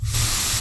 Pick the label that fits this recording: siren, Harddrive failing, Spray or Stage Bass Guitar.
Spray